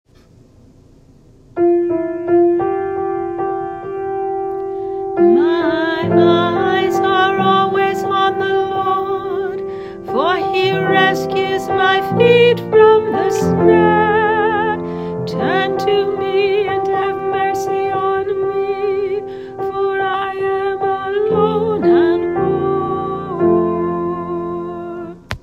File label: Entrance Antiphon; 3rd Sunday Lent